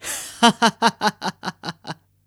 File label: voice laugh